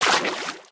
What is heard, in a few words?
water, human step